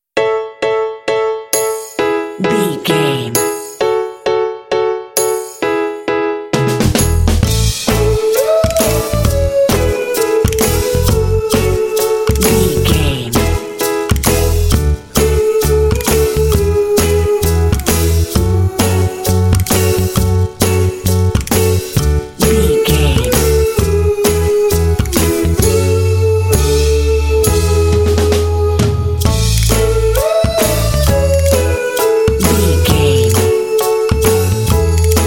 happy piano beach boy

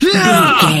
voice male attack groan

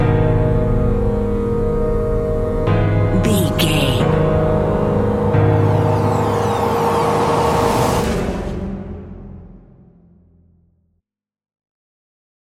crimson moonrise stinger

